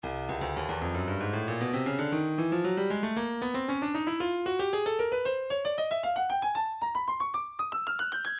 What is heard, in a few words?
Percussion Bongos, midi; Stylus Percussion Midi Files Bongos 115